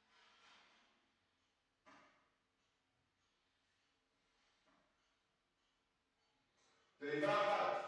Drums Verb

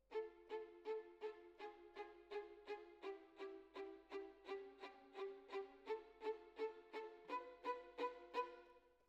Violin Solo

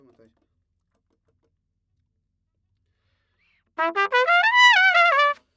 Trumpet

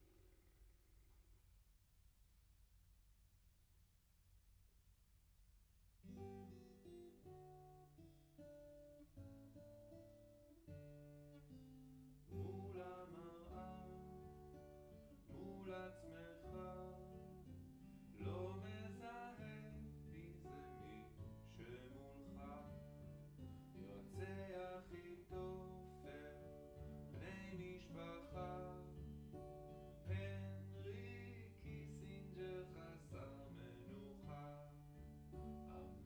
Room L

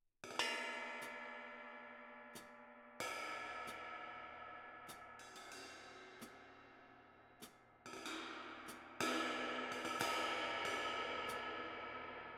Kit, 94bpm

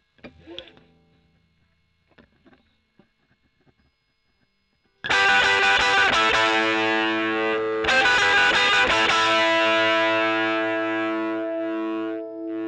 E. GTR Gefell OD

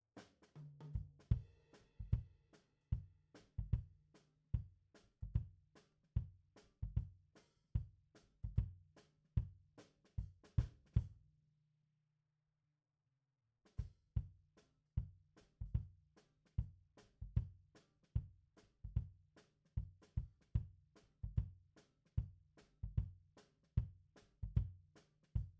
Kick out, Ragtime

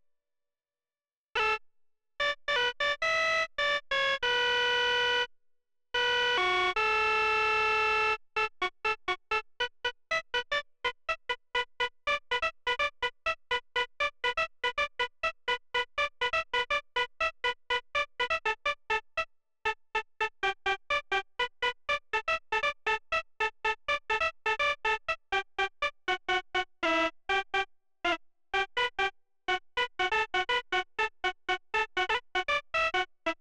MOOG

